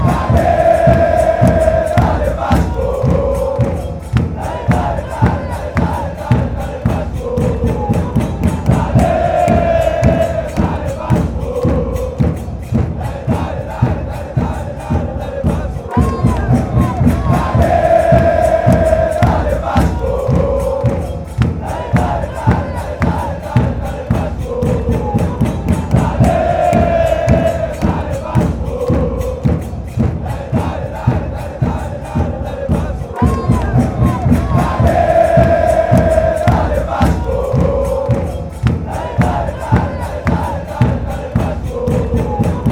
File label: dale dale, chants; vasko